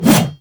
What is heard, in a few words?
Heavy Melee Whoosh; Enemies; Warframe; Grineer Nightwatch; Grineer Fortress Heavy Melee